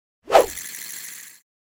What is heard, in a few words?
Fishing rod cast